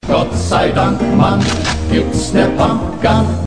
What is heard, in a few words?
pump gun